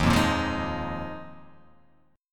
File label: D sharp Suspended 2nd Suspended 4th D sharp